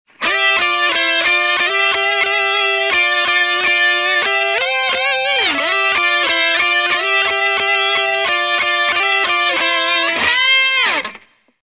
Timbre Rock Melody And Harmony

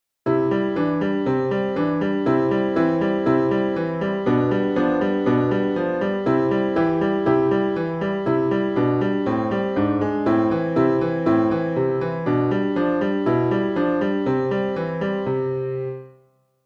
Timbre Piano Arrangement

